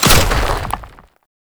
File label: Concrete Archgun Charge Full; Warframe; Archwing